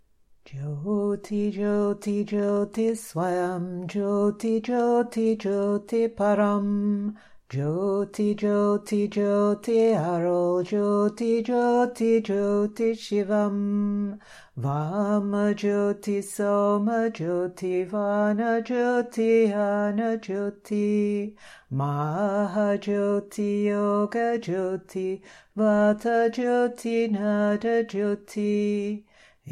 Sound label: Aarti Chant